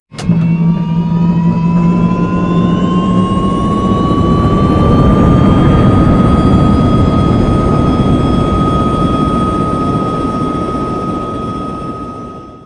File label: engine tvd start cockpit l; War Thunder